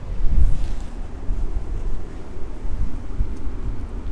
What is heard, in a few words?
loop, techno atmos